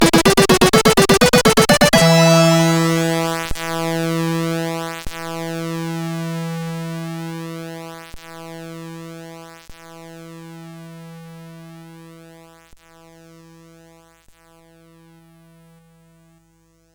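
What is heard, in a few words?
Rockit, MOS 8580 SID chip music, games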